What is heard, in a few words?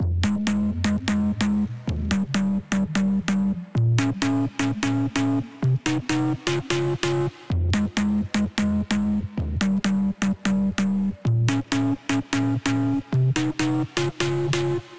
128 BPM F Min Chords; House; Drop Loops; Loop Stems; Cymatics; Sydney